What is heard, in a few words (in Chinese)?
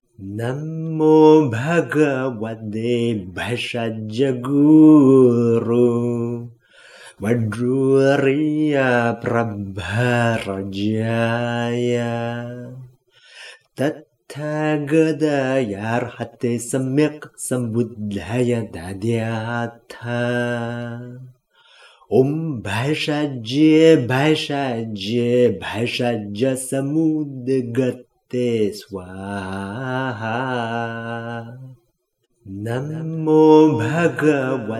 藥師咒(唱頌); 藥師佛 藥師七佛 藥師琉璃光如來 Bhaisaijya Medicine Buddha